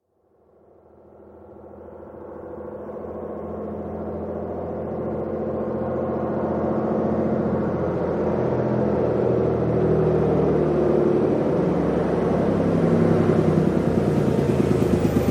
Routemaster Double-Decker Bus, exterior, arrives & departs; Bus Sound Effects; BBC